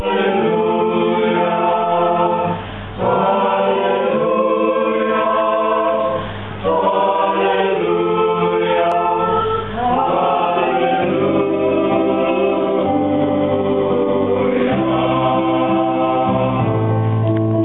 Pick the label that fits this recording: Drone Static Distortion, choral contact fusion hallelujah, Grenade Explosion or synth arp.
choral contact fusion hallelujah